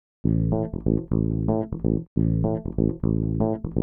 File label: DEEPHOUSE BASS 125 A